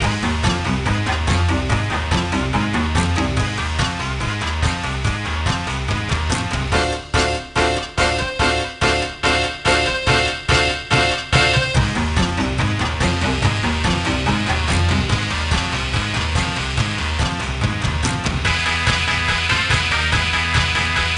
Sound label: Level Theme